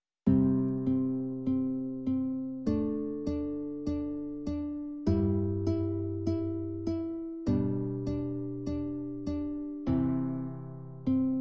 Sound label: accomp